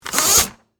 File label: Typewriter Remove, Technology, Typewriter, Remove, Paper, Fast; Typing Sound Effects; BlastWave